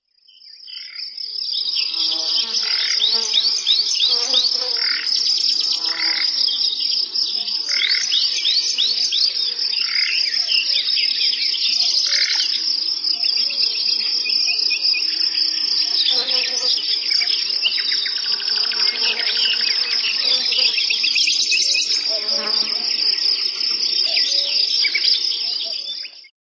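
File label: fly birds cicadas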